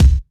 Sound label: mid-range kick drum sound c sharp key